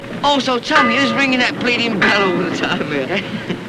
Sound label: ringing